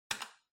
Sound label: Light Switch Selector Button, Hall Mid